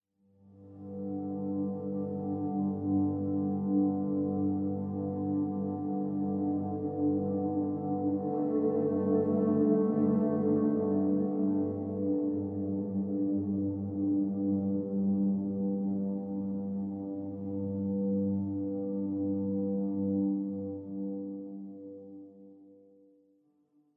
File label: Huge Drum Slams